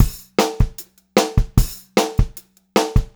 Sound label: POP BT, Pop-Rock Drumloops; 152 POP